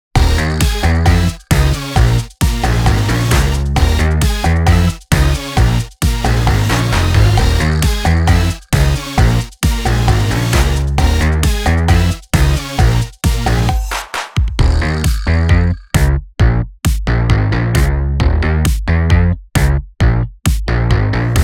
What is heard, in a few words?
off vocal -1